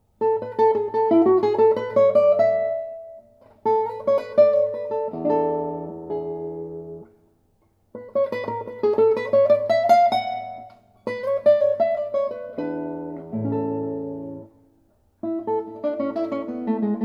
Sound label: menuet etude, guitar